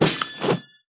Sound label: Sunburn Barbarian